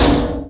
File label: string verb hit; Weird and Interesting Hits; single hits